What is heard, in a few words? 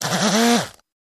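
Motor Small Vibrate, variable speed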